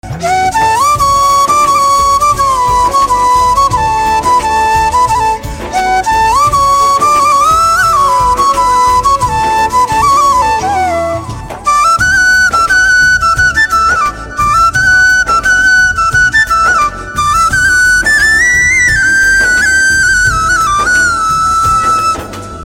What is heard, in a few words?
Flute Instrumental Ringtone